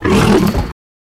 Lion Roar Mono; big cats

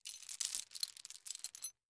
Med Chain Raised

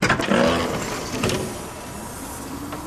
Hospital Door Open A, pneumatic

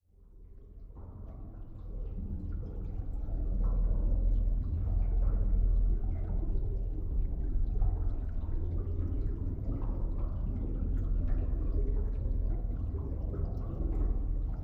Underwater Int Rumbl, metal groan atmospheres

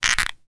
Frog California Tree

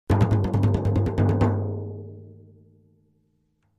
Drums Toms B Strt Id Si

